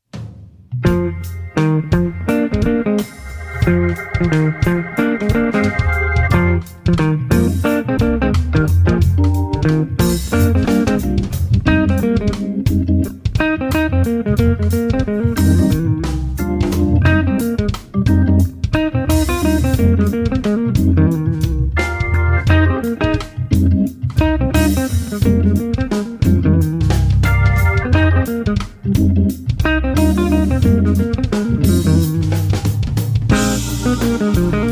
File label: Trio